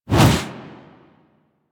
Generic Weapon Aim Transition In; Warframe; Weapons; Archwing

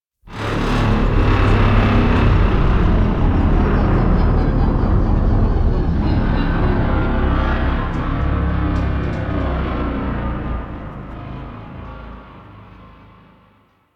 Galleon Ship Death Groan; Warframe; Vehicles; RailJack; Proc Ships

